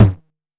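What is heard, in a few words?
CLUB high tom, CLUB KIT; Wizoo - Platinum 24 Electronic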